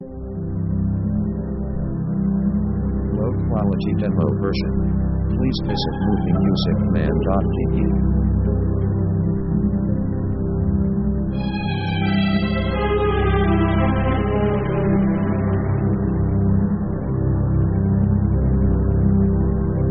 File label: suspense move, Dark mood